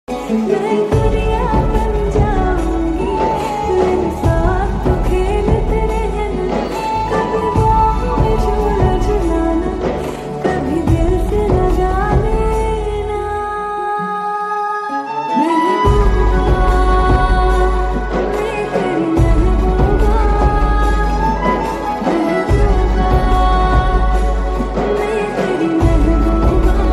Slowed And Reverb; Kgf Chapter 2

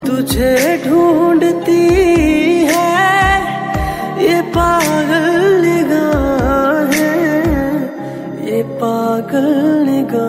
female version

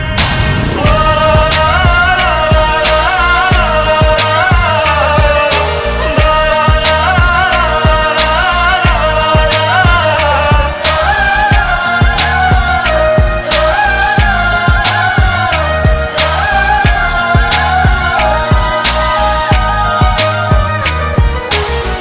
Music Instrumental

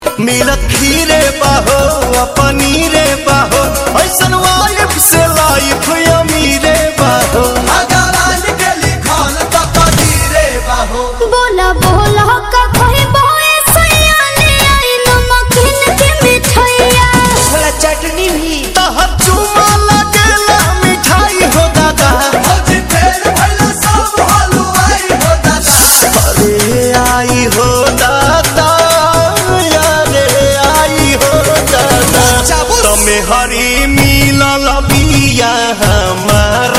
DJ song

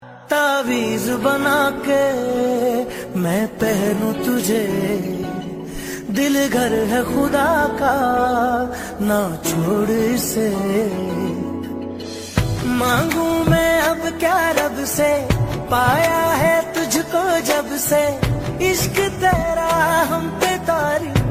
Ring tone

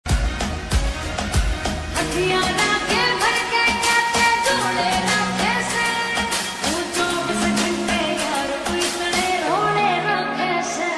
Slowed Reverb

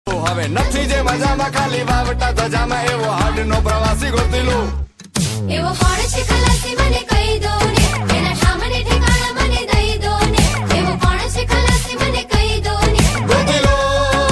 Gujarati song

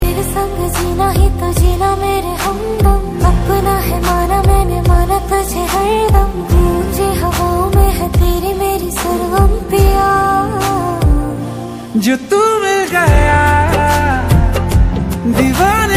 Love Song

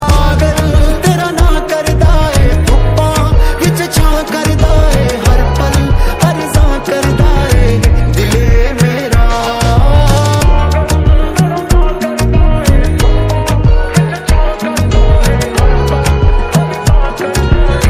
Punjabi

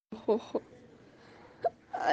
song

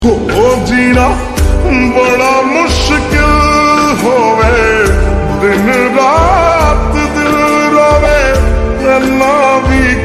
slowed reverb Punjabi song

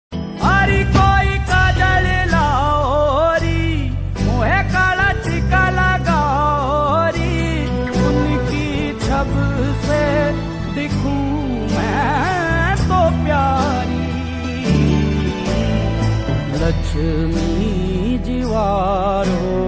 Lofi

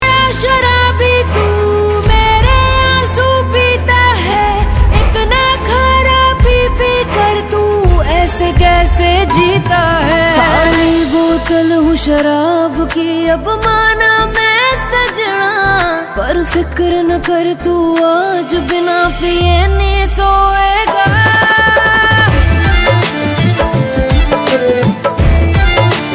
Female Version

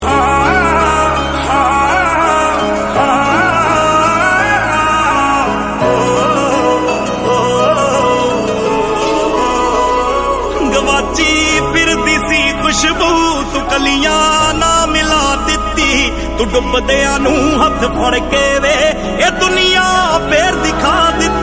New Punjabi Song

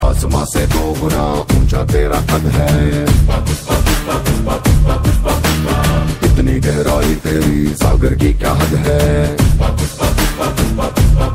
Telugu; Pushpa

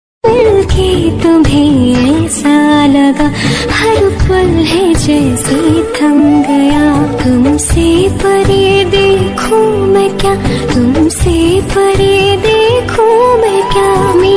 female version

